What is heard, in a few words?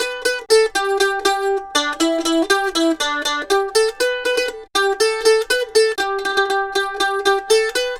Mandolin Lead; Appalachian; Continental Drift Aftershocks